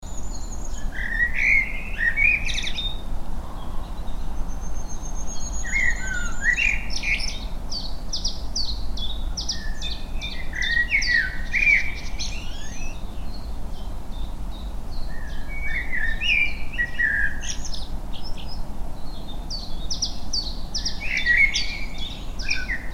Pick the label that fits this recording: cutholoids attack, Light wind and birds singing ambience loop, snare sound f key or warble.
Light wind and birds singing ambience loop